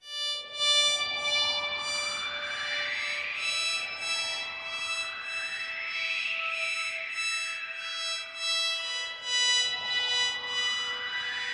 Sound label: SYN, Hip Hop and R&B Drumloops; Best Service; ProSamples vol.01; AKAI